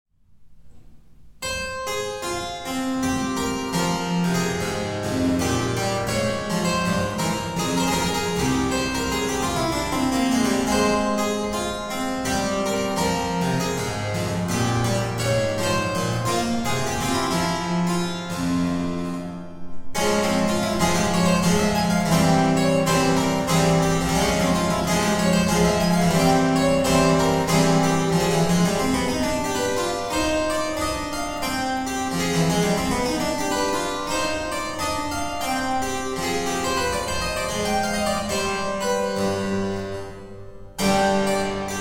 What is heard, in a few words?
Keyboard Sonata in G Minor, Andante